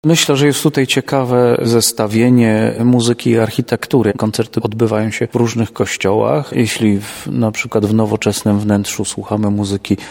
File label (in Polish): organki; kościele pod wezwaniem Świętej Barbary w Tarnobrzegu; Inauguracyjny koncert; W czwartek, 2 lipca w kościele pod wezwaniem Świętej Barbary w Tarnobrzegu odbędzie się inaug; Radio Leliwa